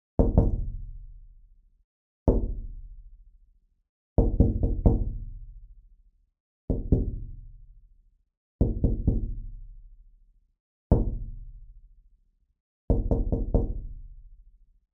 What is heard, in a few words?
knock on window